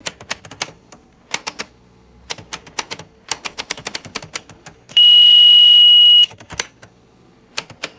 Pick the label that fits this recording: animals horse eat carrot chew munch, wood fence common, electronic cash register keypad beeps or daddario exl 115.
electronic cash register keypad beeps